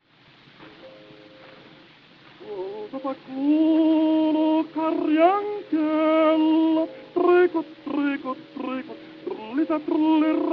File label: Gramophone